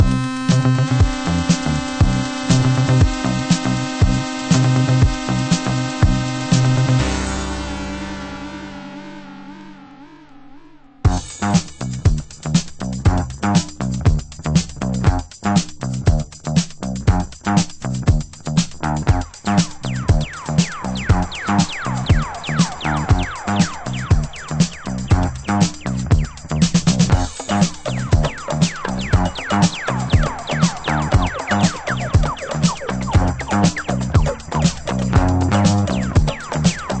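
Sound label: slap, disco dub, nu house, disco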